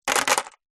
household necklace small stones pebbles set down plastic surface